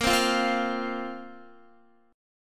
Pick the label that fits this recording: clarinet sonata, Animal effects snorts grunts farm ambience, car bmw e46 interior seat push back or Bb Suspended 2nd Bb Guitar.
Bb Suspended 2nd Bb Guitar